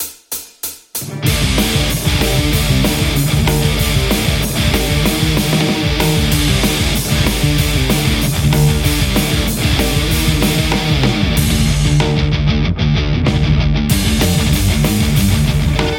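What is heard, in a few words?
metal Telecaster; ik thunderverb200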